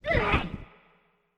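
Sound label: elderdemon damage up; monster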